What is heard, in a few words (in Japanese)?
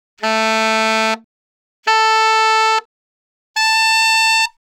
Single Reed, 気鳴楽器; Saxophone